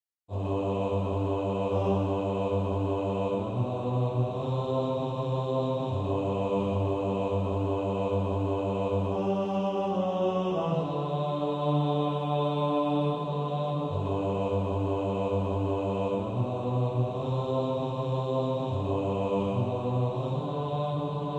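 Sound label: When the roll is called up yonder bass, choir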